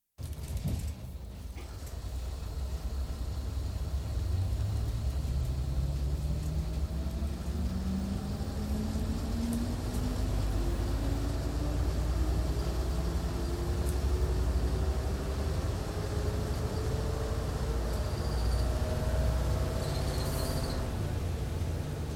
Diesel Railcar Driver's Cab, start into constant run with noticeable gear changes, signaling bell & buzzer, slows & stops with airbrakes applied, door; Train Sound Effects; BBC